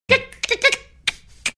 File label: making clicking sounds